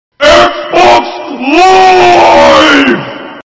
xbox live bass boosted

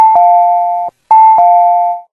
open; mtr

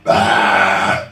massive burp

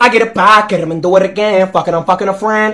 sing

